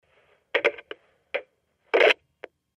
zvuk trubki v domofone

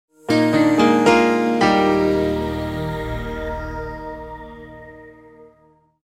muzyka dlya nachala

